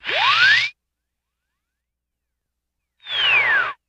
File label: Radio Whoop In Out, electronics